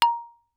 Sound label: Xylophone hard rubber ff Bb5 stereo; MIS Pitches - 2014; Percussion